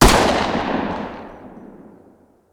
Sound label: fire 45 dist, uzi; weapons; Urban-Coalition; Decay; arccw ud